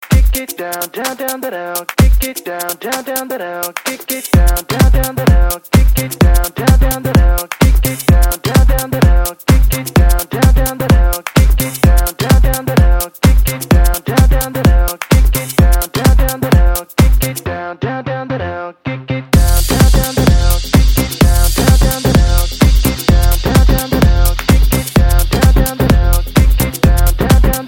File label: Classic Mix